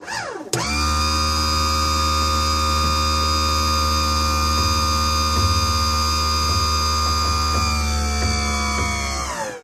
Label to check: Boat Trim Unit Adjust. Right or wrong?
Right